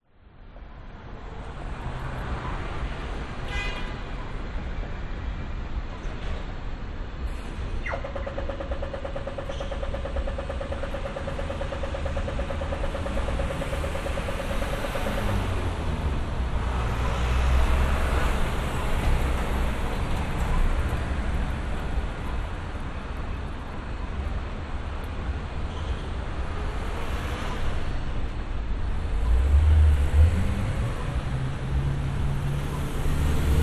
brisbane cbd traffic people crossing binaural recording